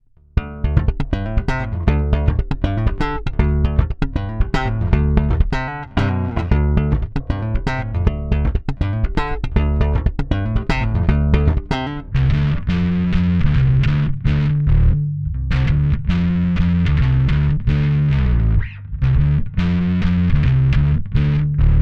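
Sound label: Clean dirty dry, guitar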